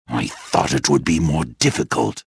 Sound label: victory; reborn